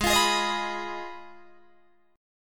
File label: Ab Minor 6th, Ab, Guitar, Open D